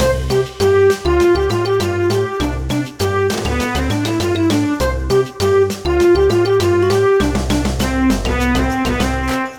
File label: Victory Loop